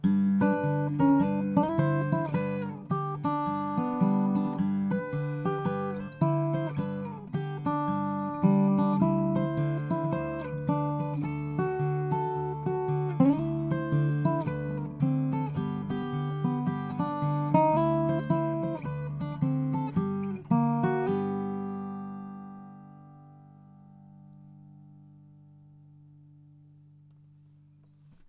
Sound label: xy; irig; wingert